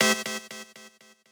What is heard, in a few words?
stab